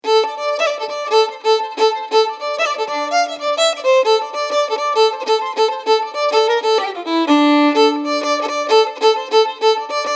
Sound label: Jig; Taminas Jig